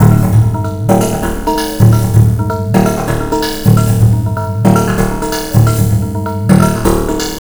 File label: Pure Tabla, 130BPM; Zero-G